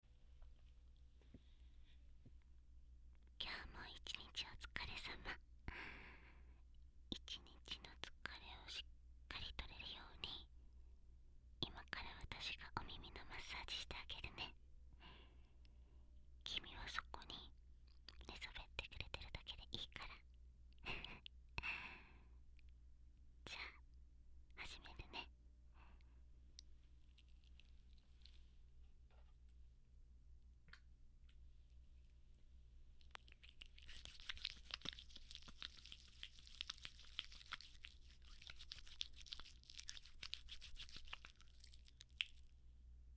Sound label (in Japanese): お耳マッサージASMR